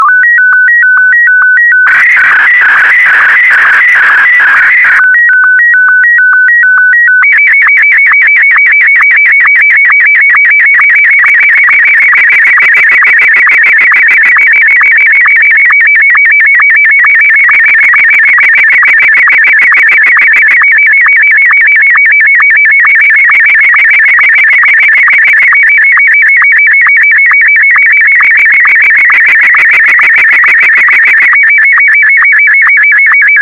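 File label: SSTV, Martin 1; AX4MSL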